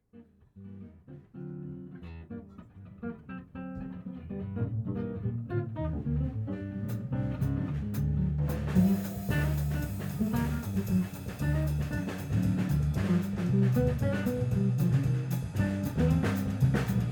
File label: Trio